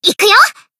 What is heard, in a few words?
Battle Shout; Zunko Newyear